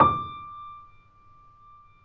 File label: PP D5, Grand Piano; AKAI S6000 CD-ROM - Volume 2; Grand Piano 256M